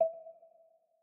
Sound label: Move E 4 Mf; Mixolumia